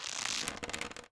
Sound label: pull, weapons